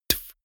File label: beatbox bass drum dull